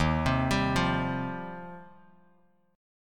D sharp 6th Add 9th D sharp Bass Standard; arpeggiated; JGuitar

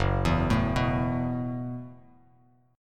Gb Minor 11th Gb, Bass Standard, chord; arpeggiated; JGuitar

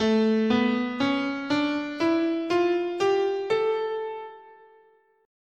A Fifth Mode, scale

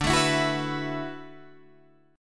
D 6th Add 9th D, Guitar, Open D

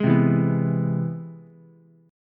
C Augmented Major 7th C down Ukulele; strummed; JGuitar

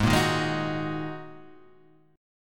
G sharp 7th Suspended 2nd, Guitar, Half Step Down

